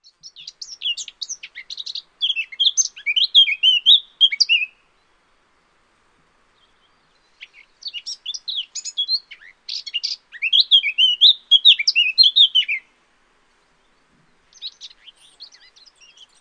curruca capirotada

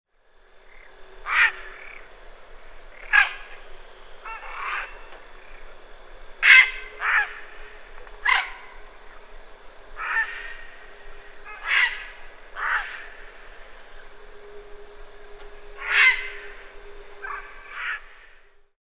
garza real

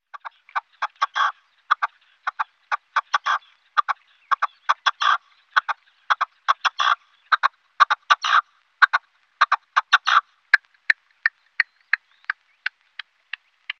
perdiz roja

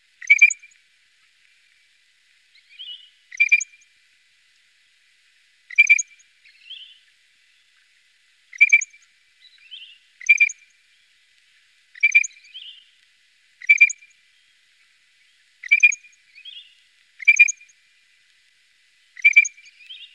alcaudon real meridional

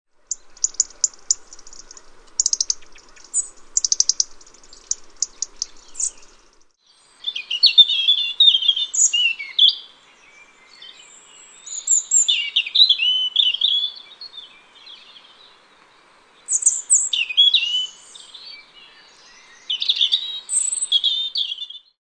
petirrojo

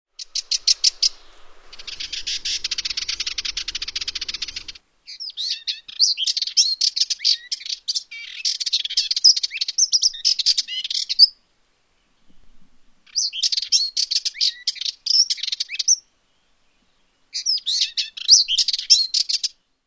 curruca cabecinegra

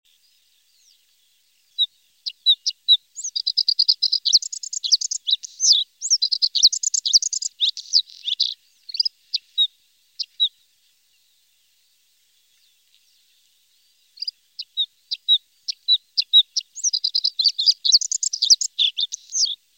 pechiazul

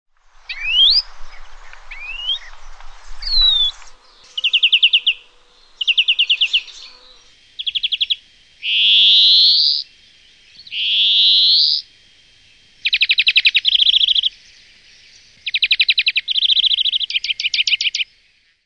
verderon comun

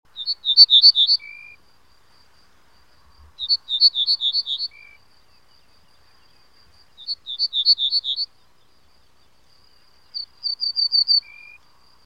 escribano hortelano

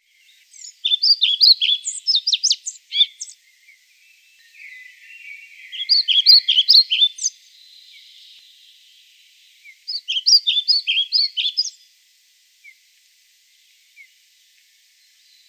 papamoscas cerrojillo

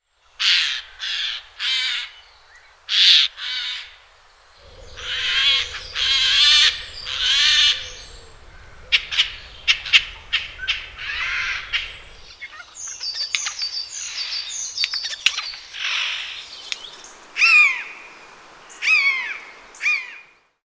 arrendajo comun

